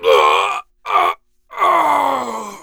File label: death; darkwing